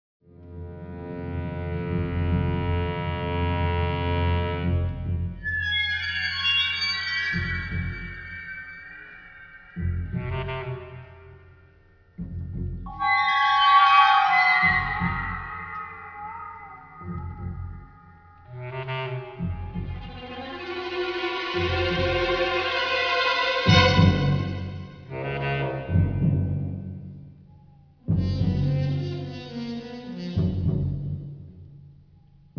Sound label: Music Ambient; nosferatu the wrath of malachi